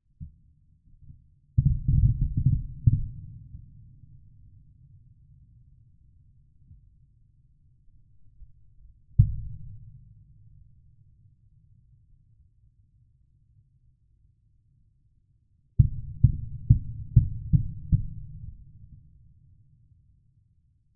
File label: bombs very distant cluster of explosions